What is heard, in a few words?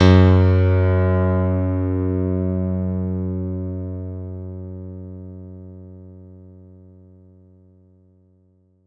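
F#1 DANCE, ANALOG SEQ, Roland JV 2080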